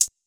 HH, Closed Hats

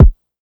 Kick

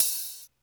Open Hats

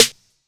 Snares

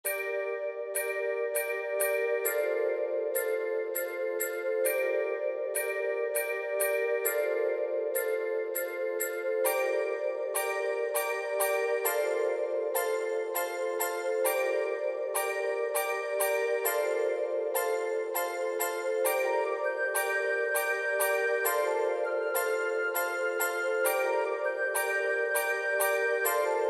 100 bpm

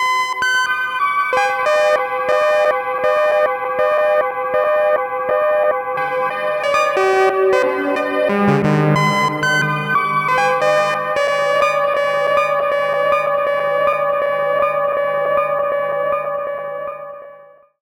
Synth Melo

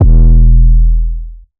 808 E; Cymatics

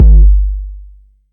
Not Nice 808's

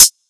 hihat

